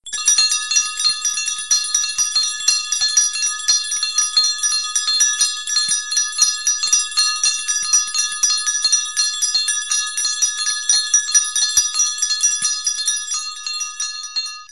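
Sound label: School bell, Little bell; SHkolnyj zvonok; Kolokolchik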